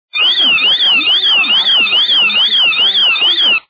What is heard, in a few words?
Alarm Sound Normal